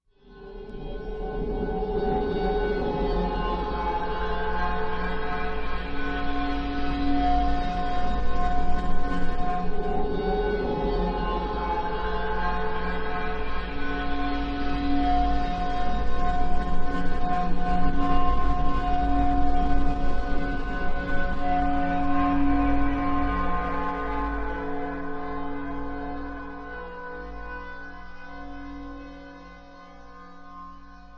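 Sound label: Perception Void Distortion, metallic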